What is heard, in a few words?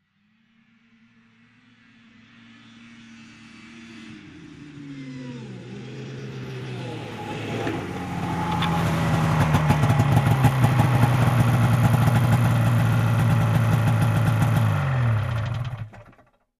Bike approaching and parking